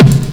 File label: hit, percussion hit